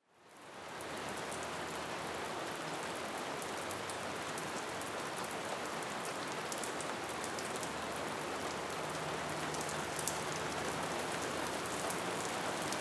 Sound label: Heavy Rain Deep House Mix